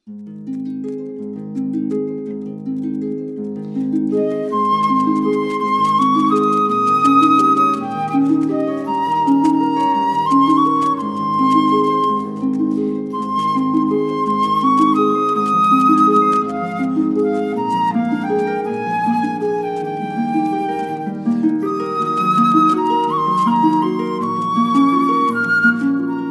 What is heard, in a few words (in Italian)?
arpa flauto; On wings of song; Mendelssohn